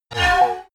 sci-fi laser shot short

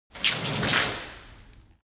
chain mail drop

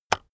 keyboard external enter release heavy

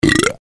belching

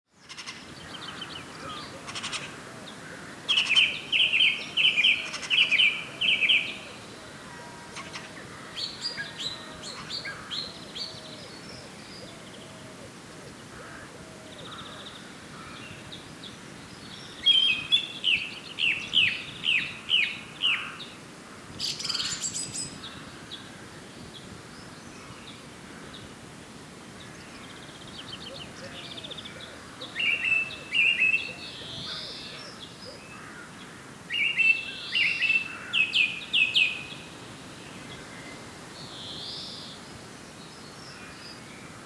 birds different meadow

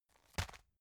tissue package drop soft

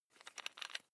electric drill bit unfasten wrench turn

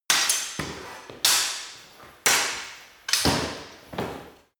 sword hit sword sequence

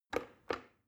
plastic button start click regular slow

